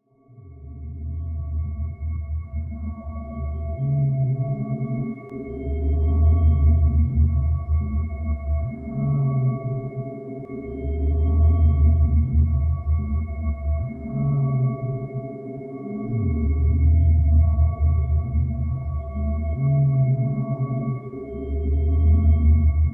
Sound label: dream atmosphere